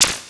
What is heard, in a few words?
death; ASCIIpOrtal